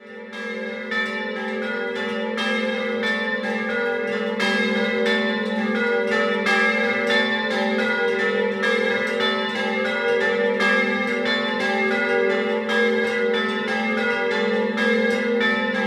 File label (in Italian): Doppio, campane; Montenars San Giorgio